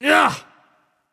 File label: grunt hit; smite; voicepack; mercury